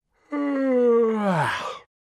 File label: Adult Man Yawning